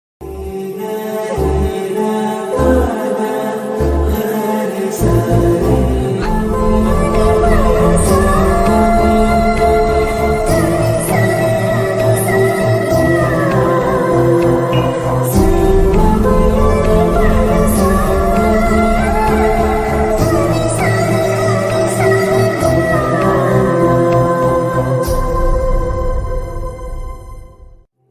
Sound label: climax emotional bgm ringtone sad bgm; geeta govindam